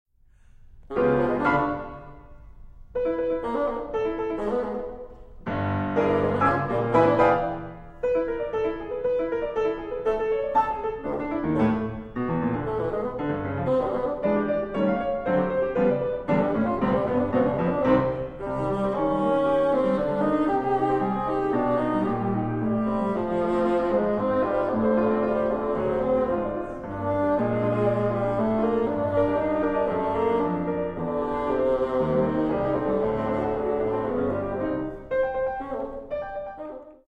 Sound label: Bassoon Sonata; mvt 3